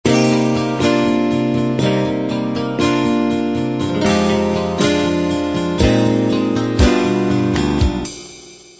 light rock intro

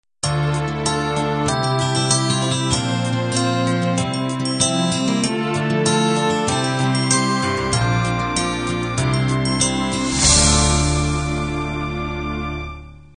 diva end

